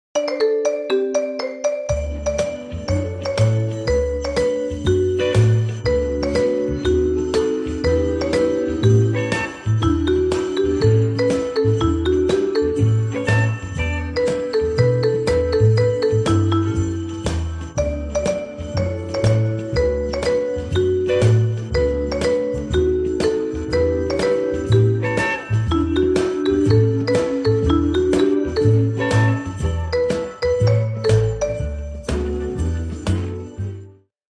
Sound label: marimba